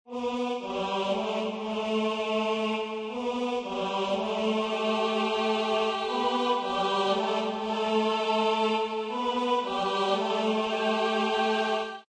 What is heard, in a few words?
choir riff, Choir loop 160 bpm.; Choir Loop Riff Cm 160 bpm